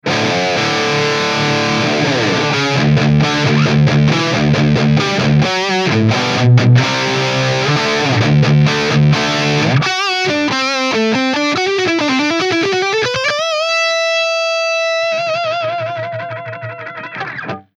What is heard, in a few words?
Engl higain